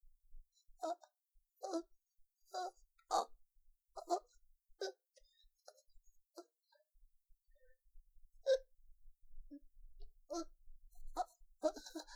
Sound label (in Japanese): 鳴き声